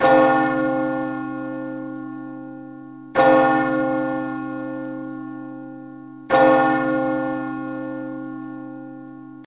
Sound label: Church bells